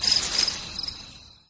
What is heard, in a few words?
Grass